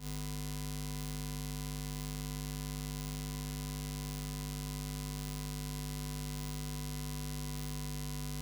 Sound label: Tape Noise; noise hiss crackle